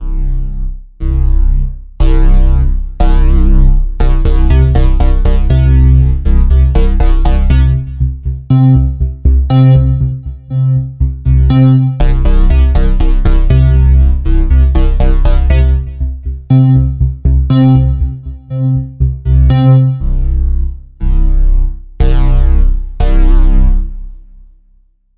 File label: bass, synthesizer